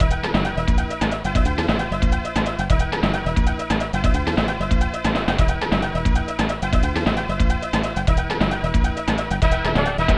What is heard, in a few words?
Win Game; super smash t.v.; snes